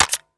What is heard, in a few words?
m4a1 clip out, weapons